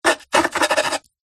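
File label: office pen biro write sign signature on thin wood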